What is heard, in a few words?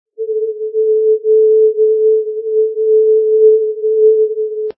ndb makassar; oj-375.0